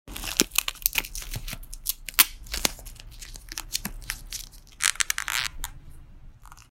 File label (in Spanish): PLASTICO